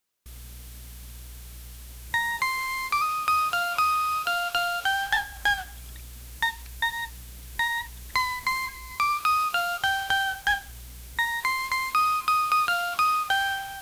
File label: intro for song